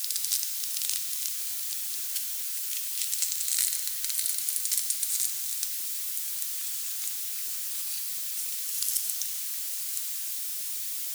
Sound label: cryo loop; Quake 4 Alpha